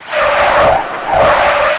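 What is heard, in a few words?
F104 Start Rev Loop; starfighter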